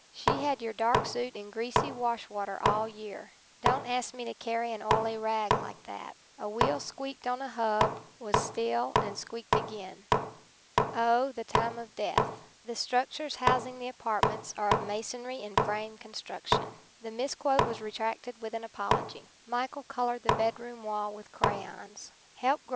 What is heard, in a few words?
noisy knock